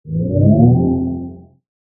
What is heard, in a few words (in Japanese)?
能力向上 モノラル